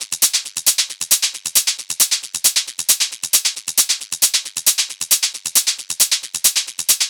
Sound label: Hat Loop 135, Techno